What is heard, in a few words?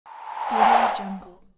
long whoosh